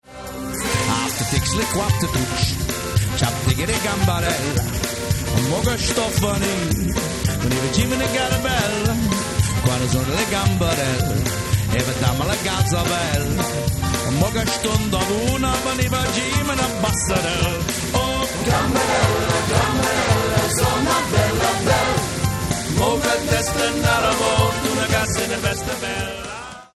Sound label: Italian Jingle BELL Slow